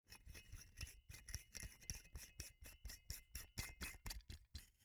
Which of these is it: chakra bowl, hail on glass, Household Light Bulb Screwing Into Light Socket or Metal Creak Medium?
Household Light Bulb Screwing Into Light Socket